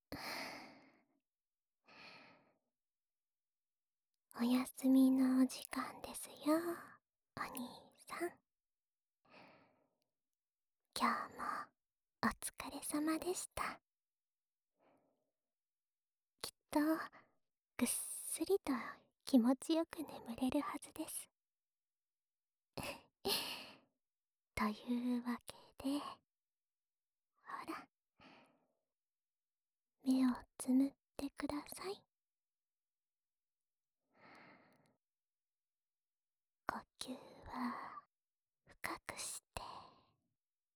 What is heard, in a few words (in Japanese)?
吐息いっぱいの添い寝 右側, ASMR